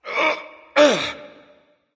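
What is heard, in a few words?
nonverbal grunt lift; smite; voicepack; ullr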